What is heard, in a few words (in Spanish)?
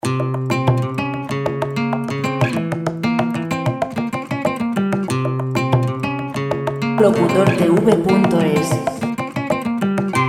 suenoandaluz 95 folk; folk musica libre